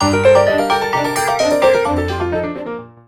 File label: Notification; Acer Liquid E1